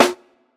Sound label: Bassy Steel Snare Drum Sound F# Key, acoustic snare sample tuned to the F#